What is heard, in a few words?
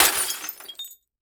Impact TV Glass